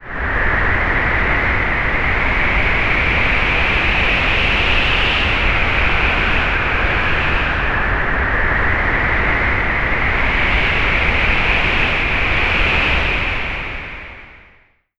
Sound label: wind strong; nature